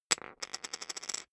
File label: poker chip fall wobble